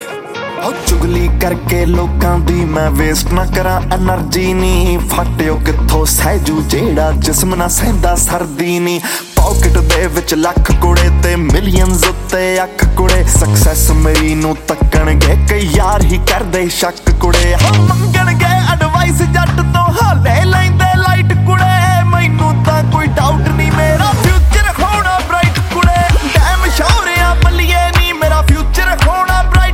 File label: Punjabi